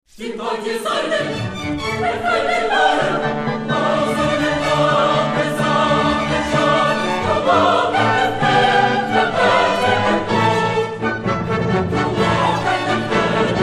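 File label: big symphonic orchestra + choir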